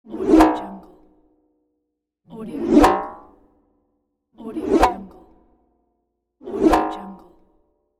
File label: twang